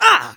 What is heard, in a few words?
pain sharp; Scout